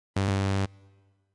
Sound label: incorrect